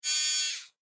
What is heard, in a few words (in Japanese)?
ロボットアーム